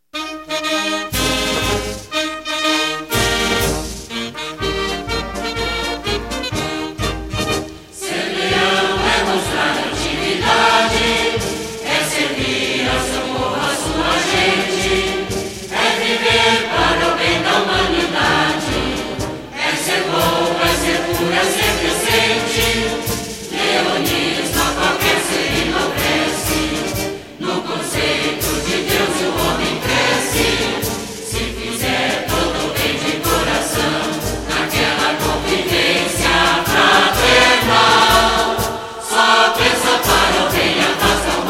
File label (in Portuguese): hino lions cantado; Hino Leonístico do Brasil: Ser Leão